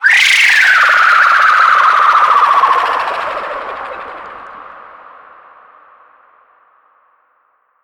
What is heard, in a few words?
Pain Scream; Bahro; moul